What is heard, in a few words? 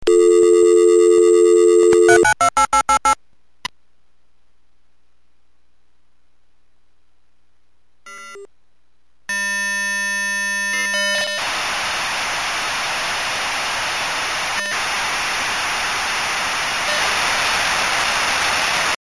sm56 v32bis, Modem Sounds